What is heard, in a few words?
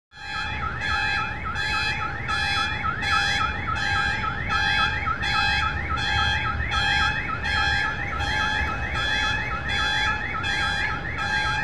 Car Alarm Medium; car alarms